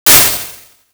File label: reverb